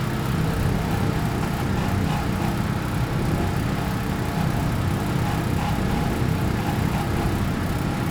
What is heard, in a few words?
Ambient Launchpad, sound effects; Among Us